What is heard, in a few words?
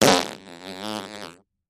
Close Up Fart